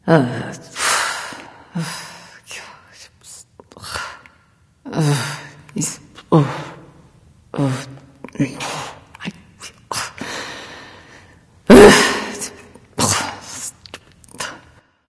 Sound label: grumble; smite; artio